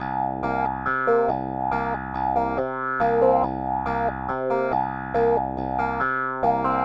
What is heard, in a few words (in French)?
basse, funk piano, second line; Vincent Kerhoas; Second Line 2 + 3